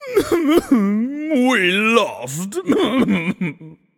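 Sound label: non verbal boohoo, voice; cernunnos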